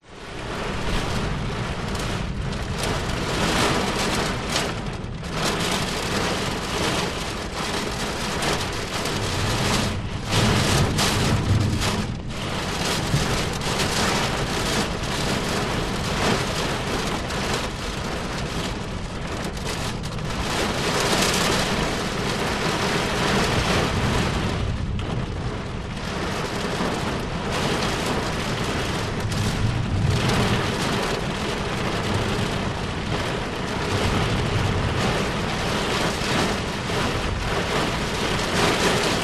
nature rain heavy windy storm thunder in car perspective; zapsplat